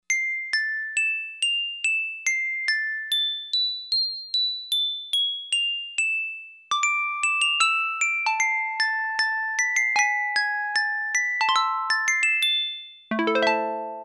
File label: Glockenspiel, Roland Juno-60; Factory presets